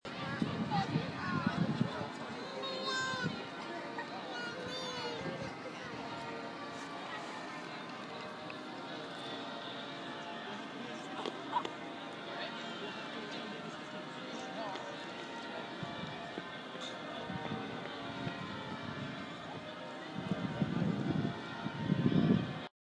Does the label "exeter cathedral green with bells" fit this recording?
Yes